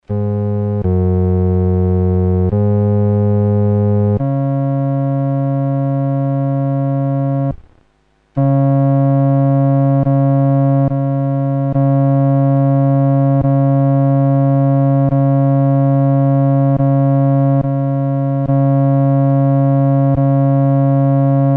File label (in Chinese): 独奏 第四声